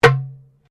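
Drum Darabuka Slap Mute forte, Egyptian Darabuka Drum; Commanly available Egyptian Darabuka Drum; Musical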